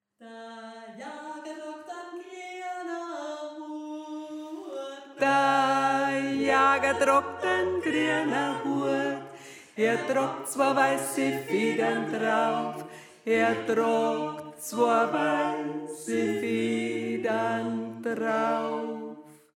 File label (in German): Tenor; Da Jaga Tragt An Greana Huat